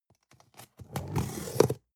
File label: cutter cardboard open